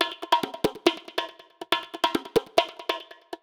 Addon Loop, Drum Loops 140bpm, Perc Loops

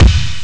heavy bass; ST-XX Amiga Soundtracker Sample Packs

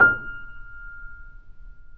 PP F 0, Grand Piano off mic

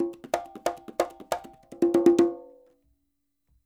SF LOOPS 92, Percussionworks; Sampleheads

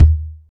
processed kick; Zero-G Deep House; single hits

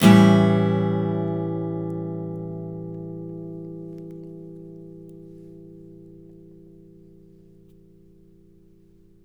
CHORDS, Blues Guitar; ILIO; Fretworks

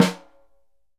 SNR, Synclavier Percussion 2 - World & Orchestral

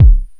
KICK, Big Beat; Big Beat Samples & More; AKAI

